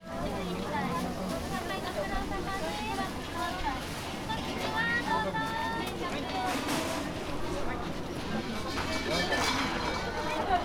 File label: takahata shrine office